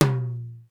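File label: high flat tom; sr16 tom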